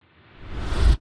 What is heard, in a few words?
dimension stop